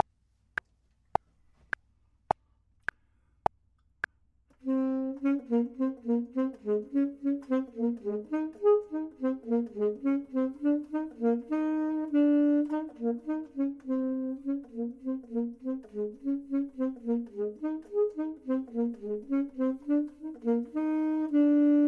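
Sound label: bottom, Saxophone and Clarinet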